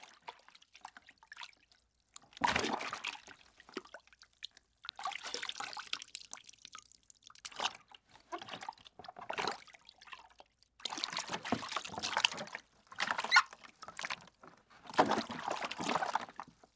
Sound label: Water Splashing in Tub; Fairlight Sound Lib